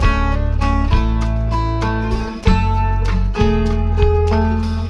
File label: guitar, Loops